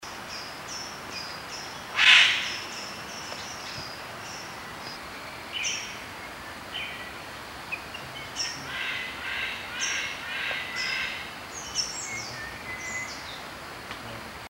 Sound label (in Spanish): Ave del paraíso de Lawes Parotia lawesii